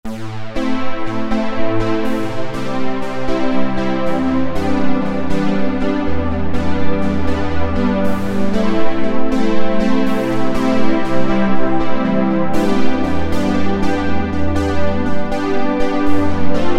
SYNTH BASICS; Planetarium; Apple GarageBand 2

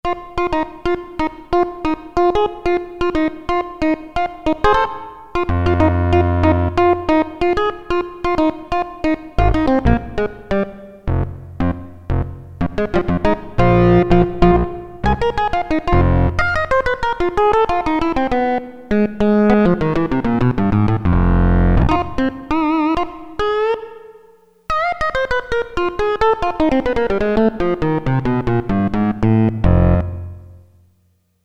LEAD, Roland Jupiter-8; DUKE LEAD; Factory patches

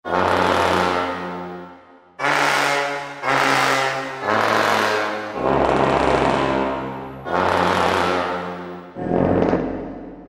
BRASS - Tibet Brass; Spectrasonics Heart Of Asia

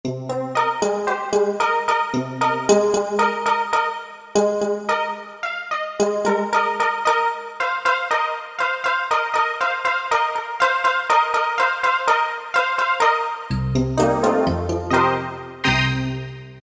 Perc Release; Roland PN-D50-04

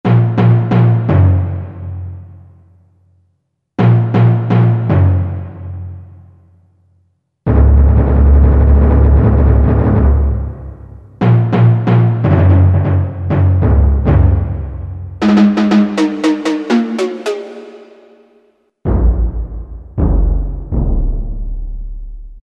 Timp, Yamaha TG500

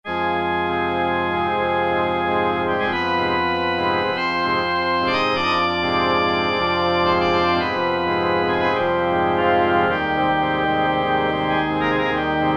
Pipe Org 1, Roland MT-32

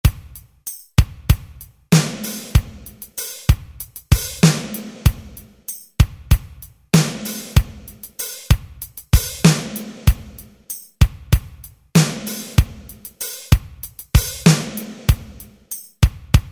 Ballad, Alesis SR-16; Preset Patterns

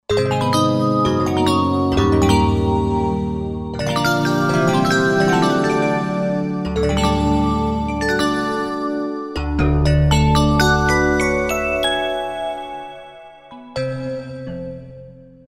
Attack Bell, Korg Wavestation A-D

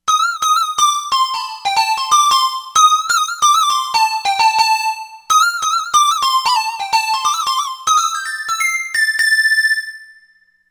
ethno lead, Korg; Korg MSC-13S Ethnic 2

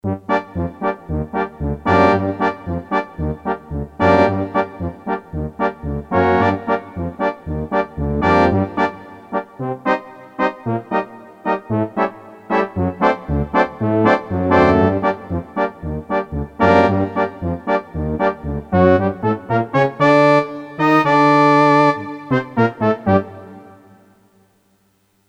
Trpt octs, Brass

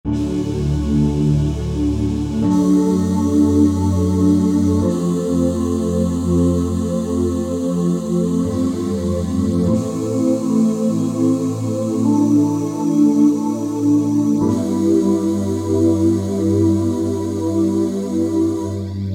New Age Oohs, E-mu Proteus VX

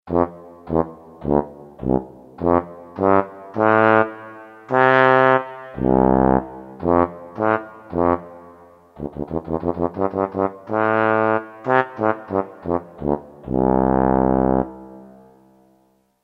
Tuba, Kurzweil K2000; BRASS; Kurzweil K2000 Value Pack; Extra Programs; BRASS1X

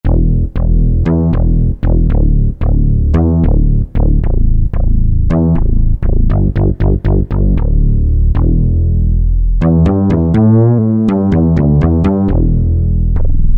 bas, E-mu Proteus FX; BigBotm

